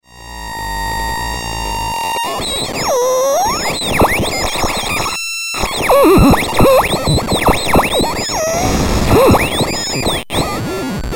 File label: SHORTWAVE